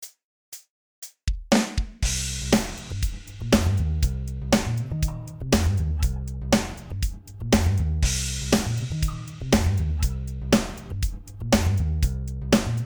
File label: drums and bass; peak rider 2 fx plugin; Impact Soundworks